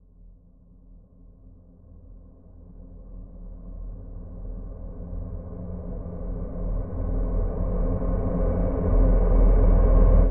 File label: Riser